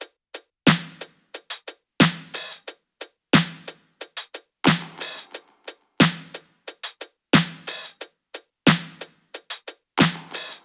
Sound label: top loop, Drums, Loops, Top Loops, Pop; windover 90; Slate; SD PASADENA Pop Sample Pack